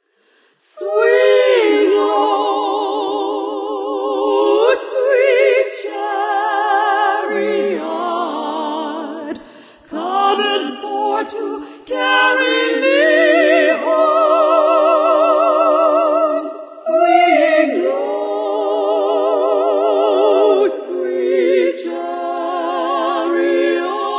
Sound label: Swing Low song lofi